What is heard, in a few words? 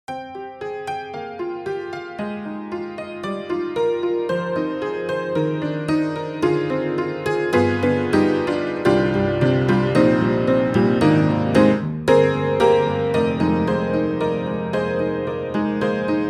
Upright Pianos Barroom Upright Honky Tonkin'; Synthogy; Ivory II